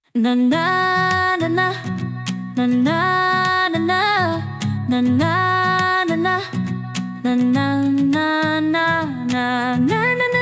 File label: polyphony, instrumental